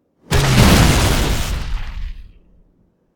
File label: Fuel Rod Shot Fast Explosion; video games; Halo 3